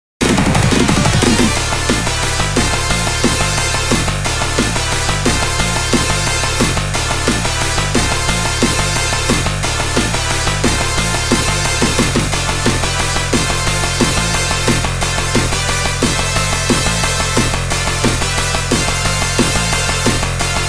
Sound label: EMERGENCY SCRAMBLE (NAME ENTRY - GAMEOVER ~ CONTINUE); R-Type Original Sound Box